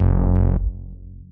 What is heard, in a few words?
alien robot, Synths; Notes